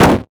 Transition Hit Impact Short

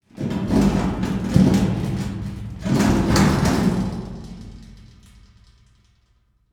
Horror Scary Can Metal Hollow Dragging On Cement

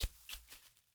Sports Helmet Dropping On Grass

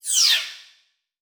Flash Animation Short

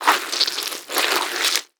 Horror Scary Violence Step And Grind On Guts

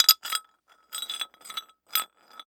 Foley Ring Metal Ring Movements

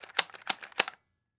Weapon Gun Machine Gun Thompson Sub Bolt Action; AdobeCC

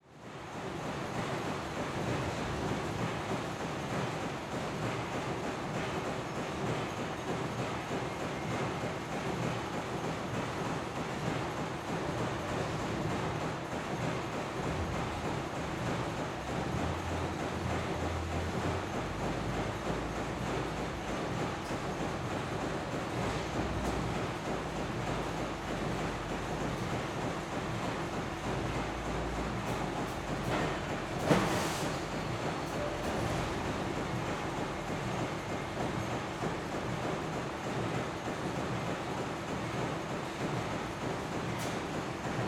Ambience Stamp Factory; AdobeCC

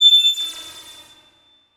Transition Laser Horn; Adobe; Production Element

